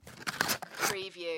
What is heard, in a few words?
Paper box or cardboard box open